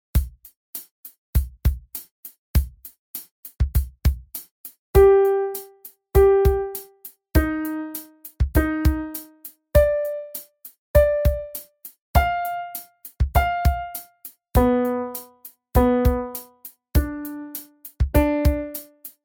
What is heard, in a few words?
random Pitch Practice